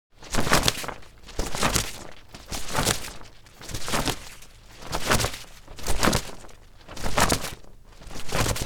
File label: Umbrella flapping and shaking; foley